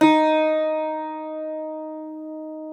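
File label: bouzuki small; SoundBytes; Early Patches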